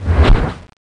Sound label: dash; The Monster Plane; kolmods dash